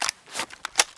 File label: reload pistol